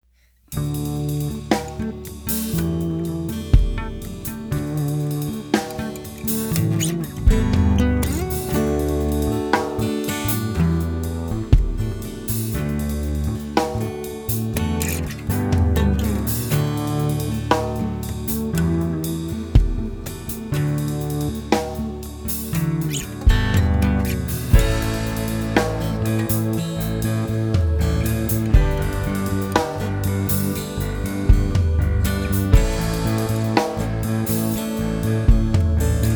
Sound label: Instrumental